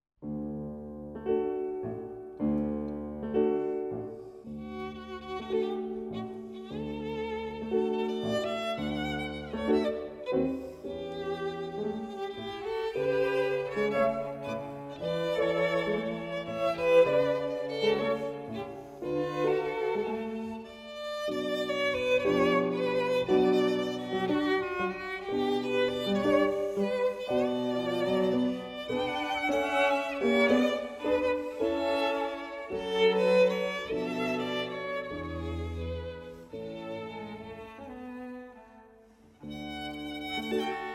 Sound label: tango; albeniz